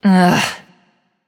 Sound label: ugh; Smite; athena